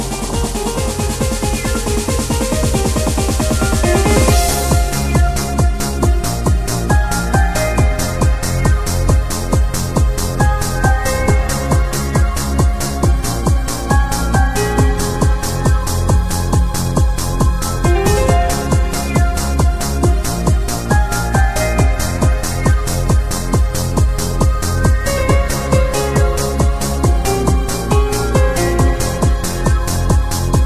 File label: Music Remix Instrumental Ringtone for Android Mobile